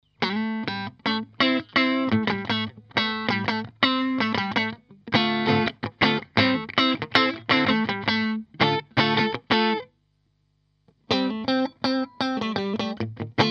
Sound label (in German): EQ Mitte Strat alle PU Schaltungen